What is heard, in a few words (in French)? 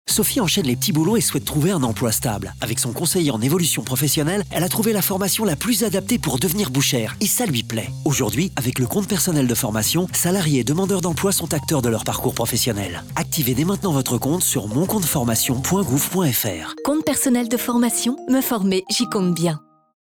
Mix Radio